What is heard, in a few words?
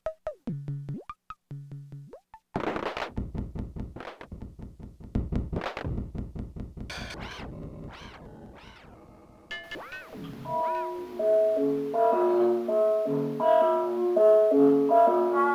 casio plod sample